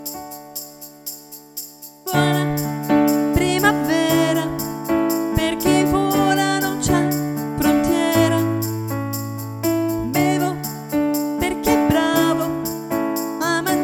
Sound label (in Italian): contralto, Voce di contralto